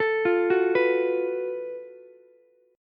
Gb Minor Major 7th Double Flat 5th, Ukulele, chord; arpeggiated; JGuitar